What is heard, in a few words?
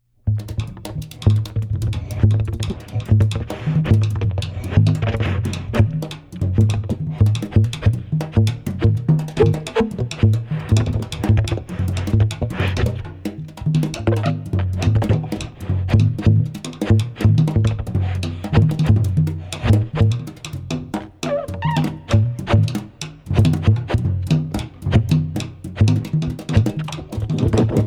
Flutter